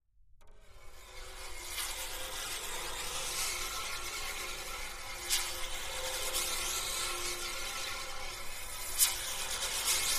Drum Metal Scrape, metal groan atmos

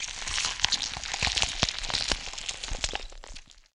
Corn Being Twisted; gore stabs & eat

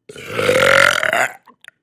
Nasty Stinky Airy B, belching

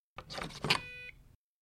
Swipe Key Card Alarm; hi-tech-various

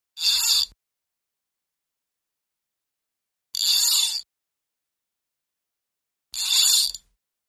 Fish Reel Hvy Spin Lin, fishing

